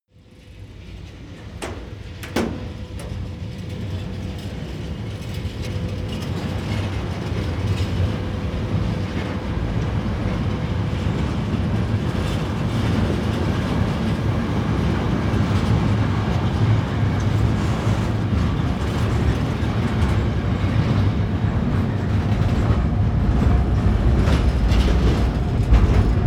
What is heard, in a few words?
train locomotive start rear